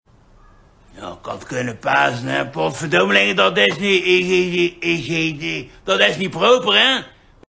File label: hihi